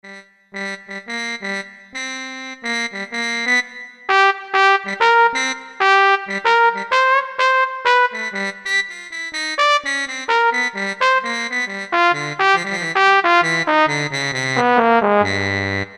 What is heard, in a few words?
Velo Trumpet, Roland U-220; Factory Patches